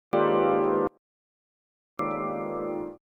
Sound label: piano